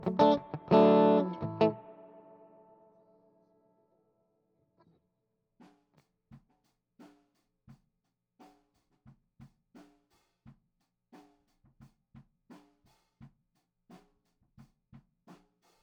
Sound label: GTR R84